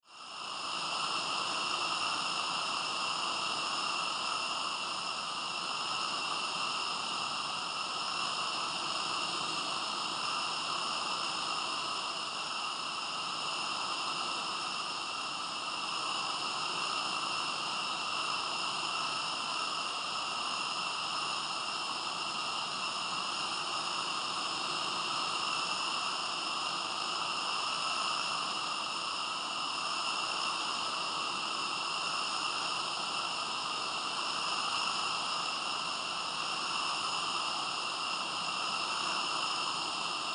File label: Science Fiction Alien Jungle Ambience Insects